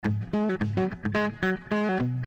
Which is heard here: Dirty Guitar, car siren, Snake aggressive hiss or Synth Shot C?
Dirty Guitar